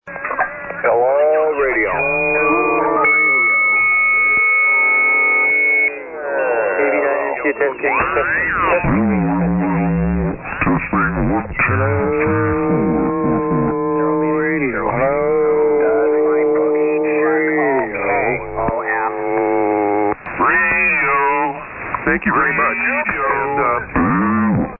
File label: SSB Net Tune Up